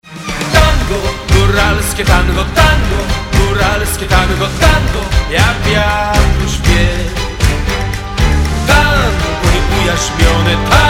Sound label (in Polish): GORALSKIE TANGO